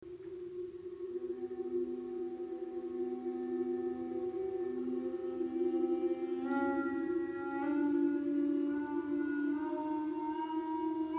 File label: TREE recorder; TREE-III